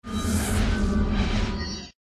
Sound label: Fire Spirit Stun